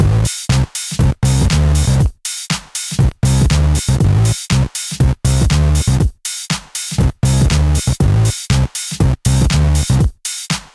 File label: Music Truck; Turbo Dismount